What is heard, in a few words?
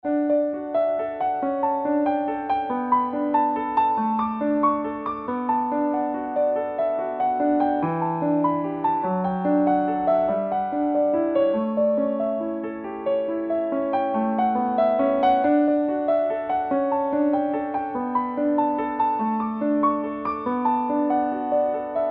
Solo Piano